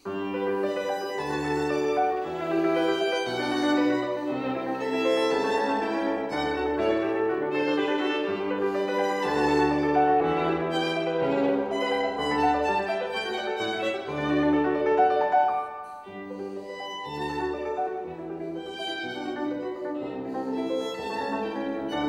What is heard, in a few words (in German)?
Violinsonate G-Dur - Variation III